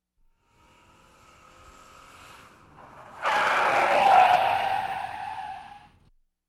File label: Domestic Car Approach And Skid